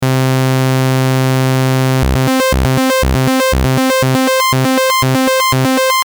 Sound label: saw